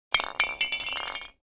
Grenade drop hard surface; gfxsounds

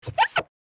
Glass window cleaning

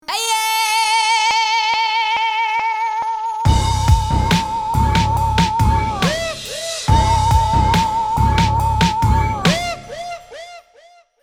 Aggressive thug life drop; social media

